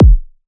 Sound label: big kick